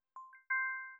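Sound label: granular kalimba accent C major; bespoke - Floral v.2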